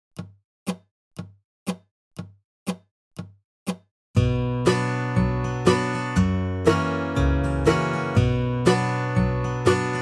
Bm - 60 BPM

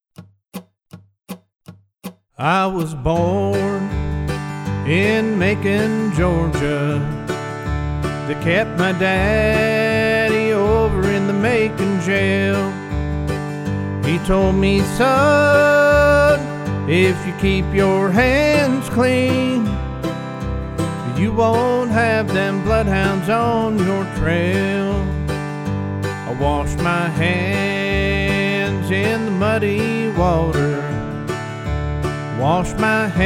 Key of G vocal and backing track

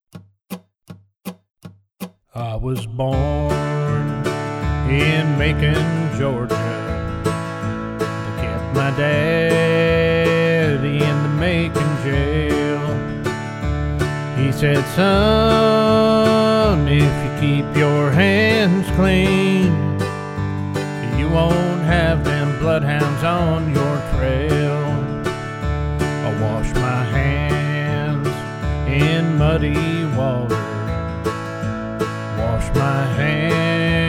Key of D vocal and backing track

